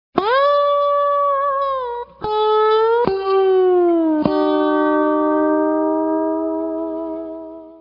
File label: compressor, effects